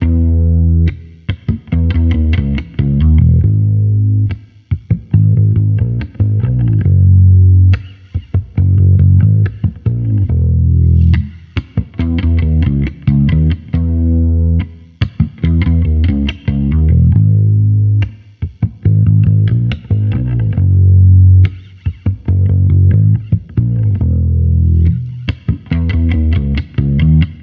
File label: Amped loop 140 Abm, Electric Bass Loops; Slate Digital BASSHOLE Sample Pack